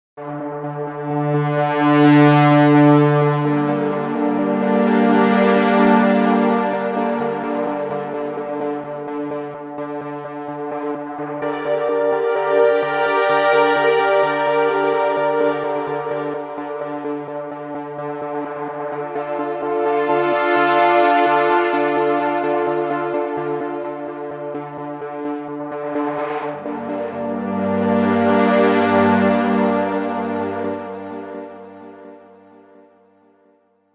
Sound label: Brass Designer RHY Swelling Dreamers